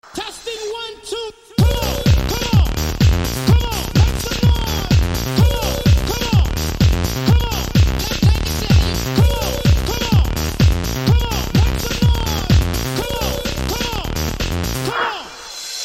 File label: Techno Bass